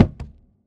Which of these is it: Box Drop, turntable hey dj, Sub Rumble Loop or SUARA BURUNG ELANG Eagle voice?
Box Drop